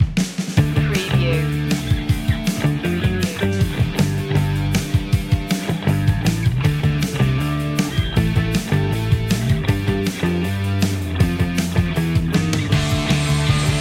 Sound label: turbo energy rock